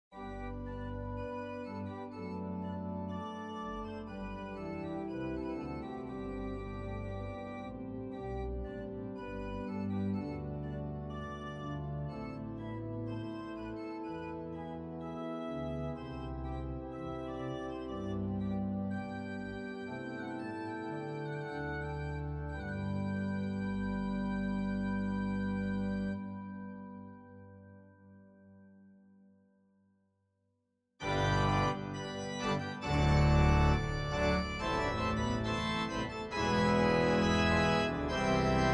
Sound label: Zwolle front omni rear